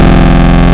Octave Squares, wavetables; Animoog

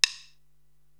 Drumstick Hit

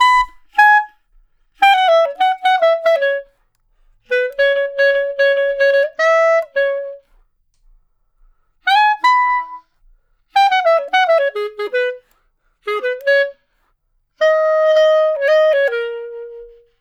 Clarinet Solo

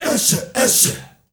ESHEESHE L, GRP CHANT, Heart of Africa; Spectrasonics; Heart of Africa 1

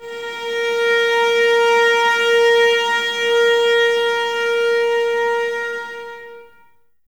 STR VAS A#, Violas, No Vib; Miroslav Vitous

